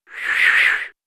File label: Breathy round